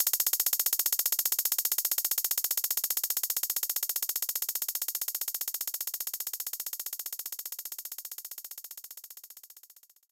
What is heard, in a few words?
TR 808 Hat; knights try